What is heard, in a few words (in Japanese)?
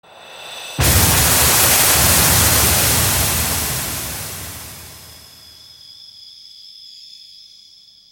kaminari utareru; 雷に打たれる; 効果音素材